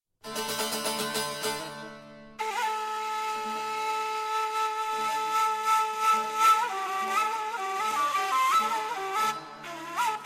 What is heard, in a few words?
Kurd Shepherd Melody Instrumental